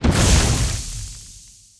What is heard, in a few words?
ex water; allods2d